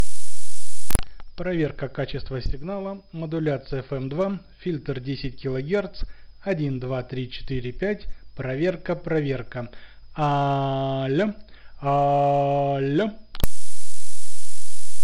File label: malamute trx fm 10khz filter sample